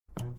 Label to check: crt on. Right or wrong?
Right